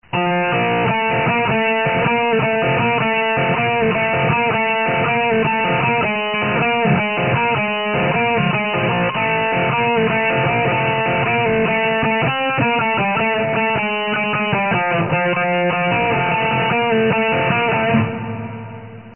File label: car